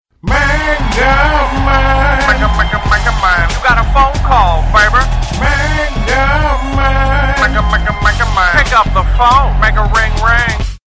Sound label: Ringtone; Megamind